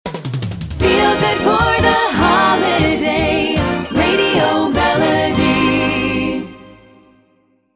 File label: feel good for the holiday radio melody, sung jingle